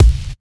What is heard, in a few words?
punchy bass drum a key, kickdrum tuned to the A note. Loudest frequency: 394Hz; kick drum samples